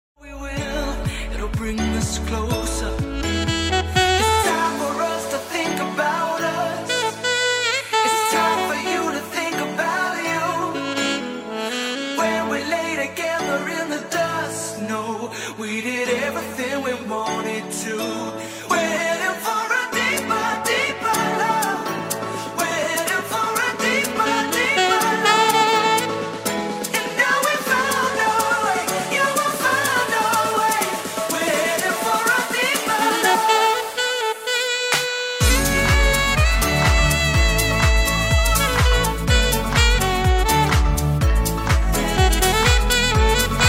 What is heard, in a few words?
Sax